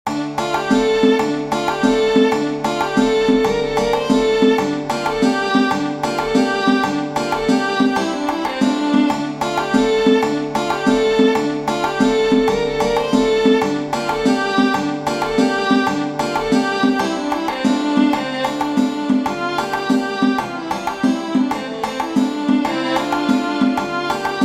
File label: Serbian Racenica; danza originaria della Bulgaria; Folk; Tradizionale; "Serbian Racenica" è, a dispetto del nome, un brano musicale